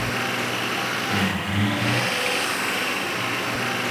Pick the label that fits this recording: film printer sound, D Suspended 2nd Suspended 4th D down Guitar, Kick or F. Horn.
film printer sound